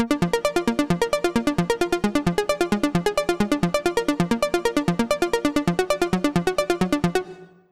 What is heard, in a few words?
Arps; Roland Cloud BOSS Effects Pedals